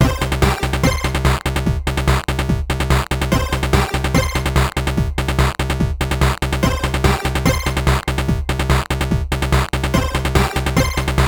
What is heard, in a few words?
Chiptune 8 Bit; Chiptune is a unique genre of electronic music; Soundtrap